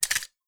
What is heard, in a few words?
usp clip out, weapons; usp45; garrysmod